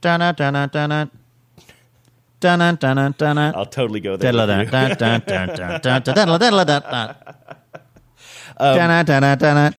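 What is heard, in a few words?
singing underground theme; super mario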